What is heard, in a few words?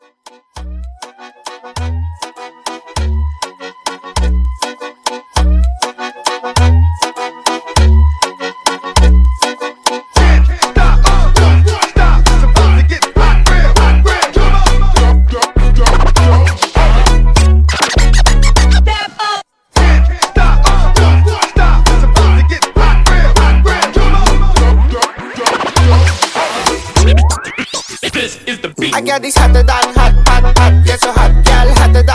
Hype Scratch; Gualtiero